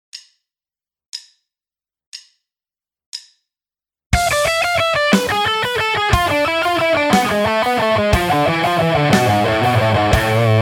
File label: Alt picking benchmark test, guitar; 30 day guitar challenge